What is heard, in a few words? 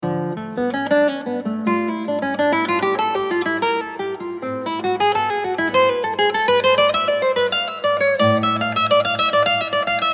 ciaccona